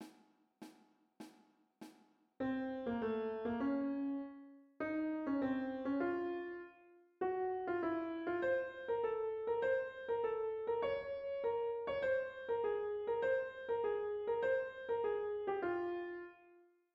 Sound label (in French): MARCHE TURQUE en sautes